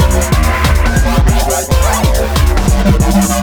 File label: Electro; BeatBlasters III